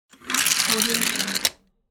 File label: rolling plastic toy car